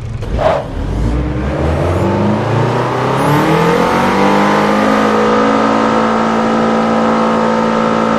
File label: rev, vehicles cars; tdmcars